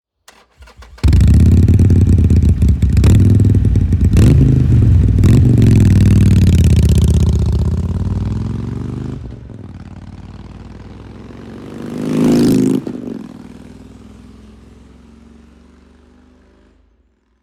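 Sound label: Sportster Open, exhaust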